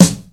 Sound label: old school hot hip hop acoustic snare f sharp key